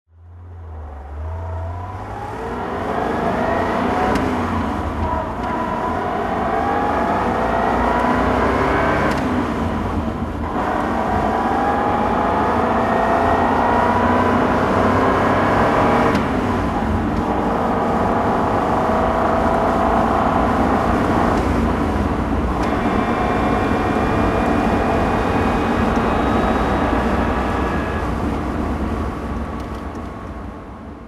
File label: alfa romeo 2600 spider driving